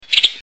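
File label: Western Tanager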